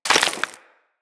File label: Bullet Impact Debris Rock